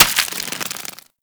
Devour Enemy Bite; Warframe; Mummy

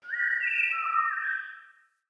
Wetland Forest Day Creature Distant; Midrath Island; Ambience

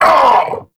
Vocal Set Pain; Warframe; Duviri; Dax

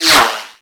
Bullet Whizzby; Warframe; Weapons

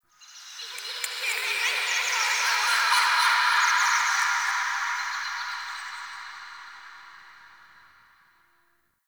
Park Memory Echoes, ambience; Warframe; The New War; Zariman

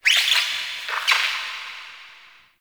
Critter Squeak Alt; Warframe; Ambience; Nokko Colony

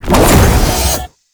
Cast Riser; Warframe; Uriel; Demonium

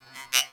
Beep Comby; Warframe; Corpus

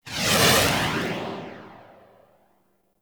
Iron Eruption Active Move; Warframe; Warframes

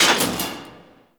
Missile Launcher Mech; Rail Jack; Warframe; Vehicles; Rail Jack Weapons

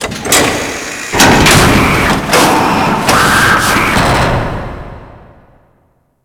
Dojo Shutter Door Open; Clan Dojo; Orokin

